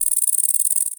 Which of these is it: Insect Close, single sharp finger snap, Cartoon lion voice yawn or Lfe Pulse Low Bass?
Insect Close